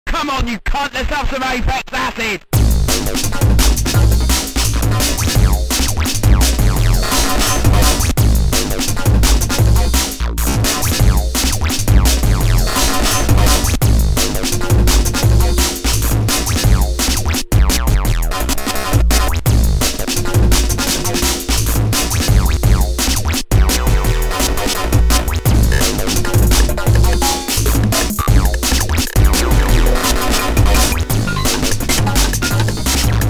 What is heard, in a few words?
music